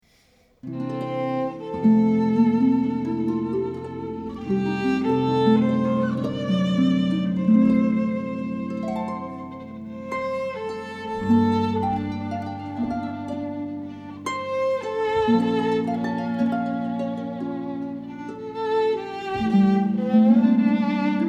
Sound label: w/ violin